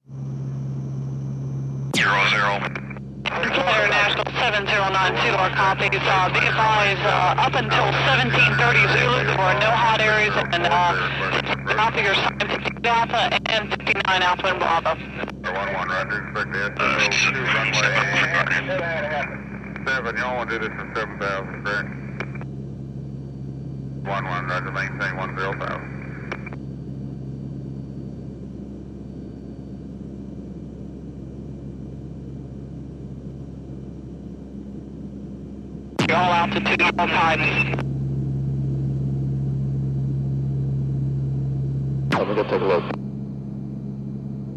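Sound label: P3 Orion Int Steady Flight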